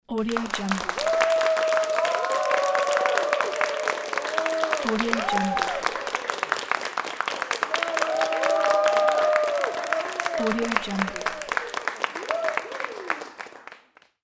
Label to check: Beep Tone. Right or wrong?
Wrong